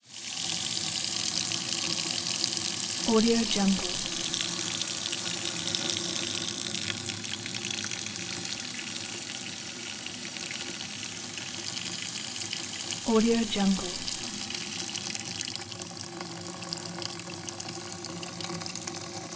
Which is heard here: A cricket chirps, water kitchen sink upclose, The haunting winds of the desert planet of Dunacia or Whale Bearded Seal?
water kitchen sink upclose